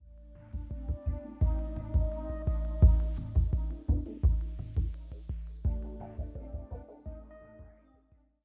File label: 85bpm; Legends of Pegasus; windows gamerip 2012